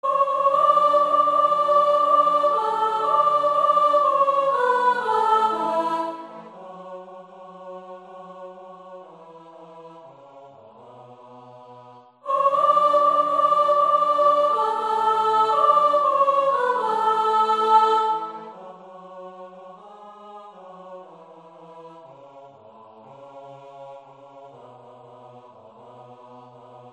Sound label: Verse + Chorus Alto